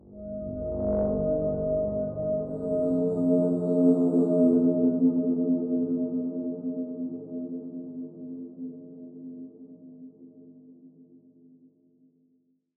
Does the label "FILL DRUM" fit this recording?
No